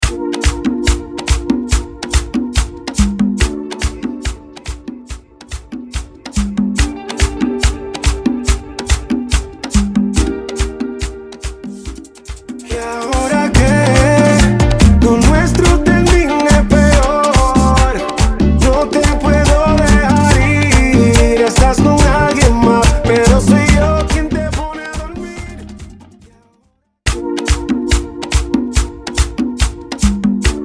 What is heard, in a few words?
Club Rework 142 BPM